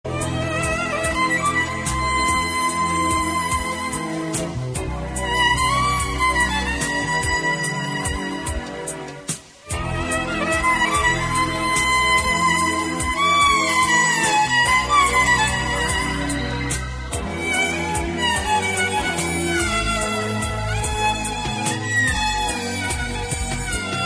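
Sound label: spanish violin; classical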